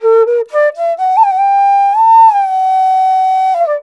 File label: INDIA ALTO